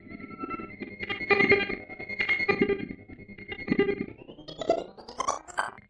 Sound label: stutter telemetry; Various Strange Sounds and Effects